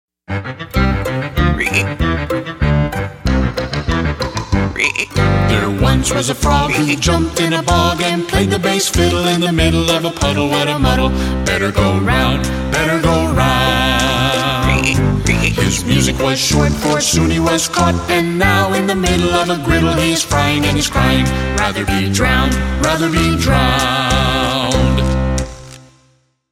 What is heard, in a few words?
Frog Music; A Beka; Songs we enjoy 3